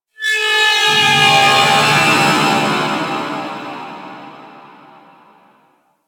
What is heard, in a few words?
Space Trench, Ambience Space Battles; Warframe